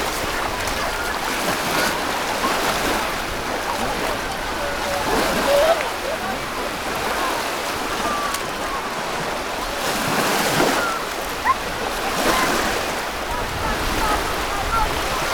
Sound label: people bathing in the sea ambience